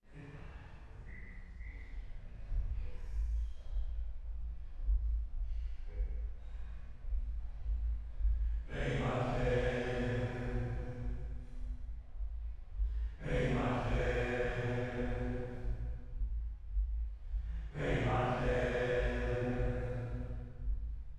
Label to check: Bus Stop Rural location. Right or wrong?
Wrong